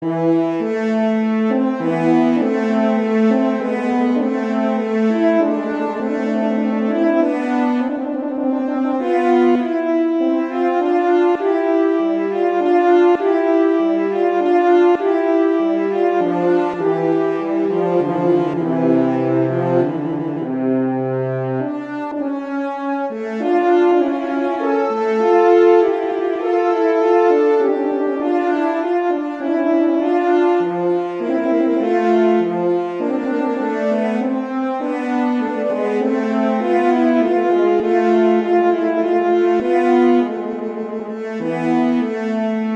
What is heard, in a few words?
Cantabile, horns; Telemann; Canonic